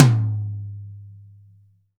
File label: Mid Tom